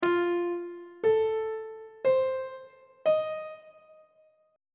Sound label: c dorian 7th chord 4 is f dominant 7th on treble clef